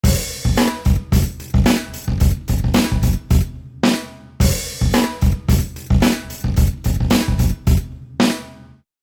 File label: drum loop Big Room